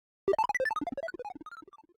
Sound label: Boss BigPunch Stinger; Avie